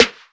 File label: Snare; Ableton Live 12 Lite Core Library